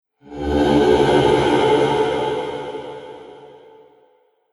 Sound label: Sci-fi Monster Laser Lizard, alien